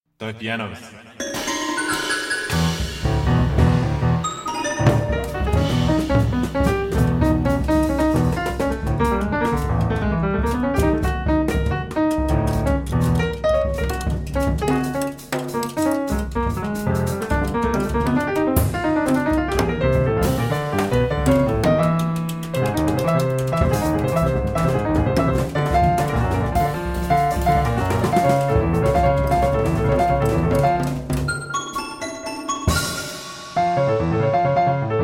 Toy Pianos

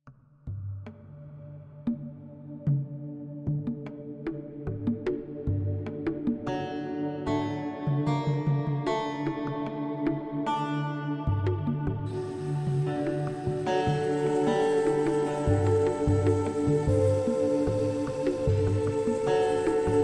Arabic Trance

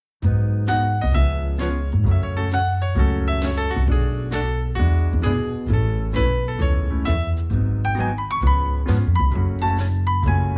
Jazz Lullabies

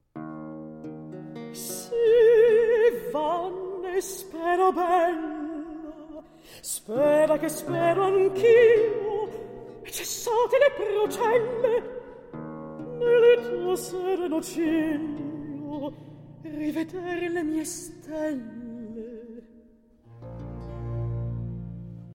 Recitativo; Teseo

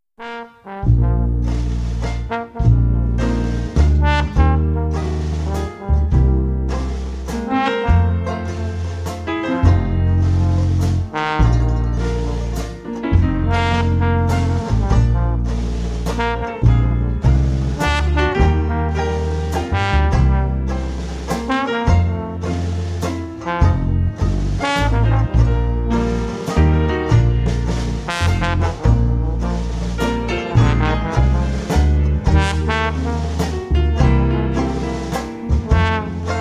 New Orleans Jazz Band

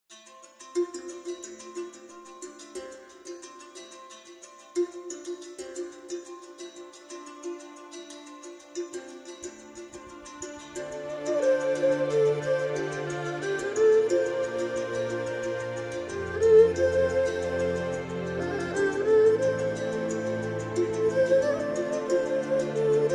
Instrumental

